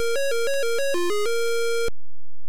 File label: Title Beeper; Gunfright